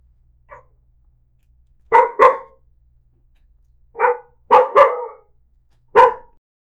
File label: sound of a sad dog